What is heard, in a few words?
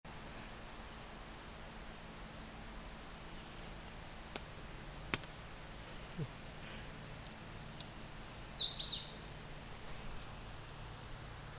SWWA singing; Bear Run NR